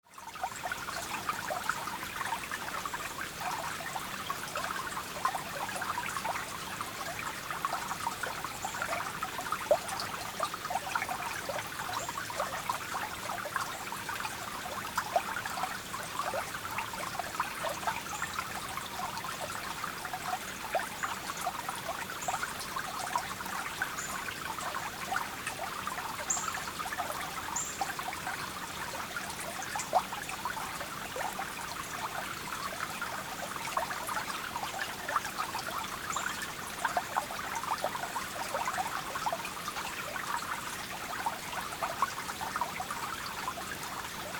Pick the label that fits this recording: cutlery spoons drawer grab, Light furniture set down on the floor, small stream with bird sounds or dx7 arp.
small stream with bird sounds